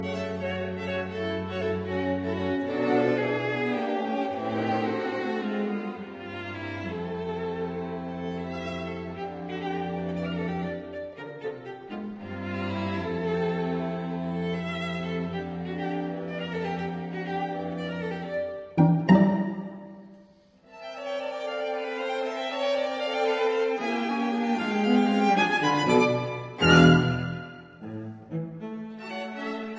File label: String Quartet in E minor Allegretto grazioso; Bridge; Bologna